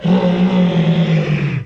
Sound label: moan; svencoop; lostmaze; gonome